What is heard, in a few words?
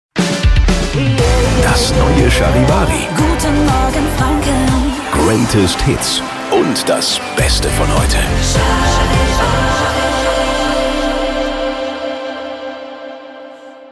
Transition Hot to Soft Good Morning; Charivari